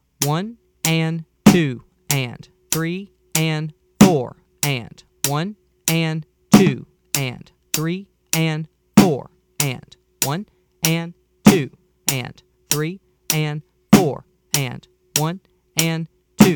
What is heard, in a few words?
Beat 2 With Snare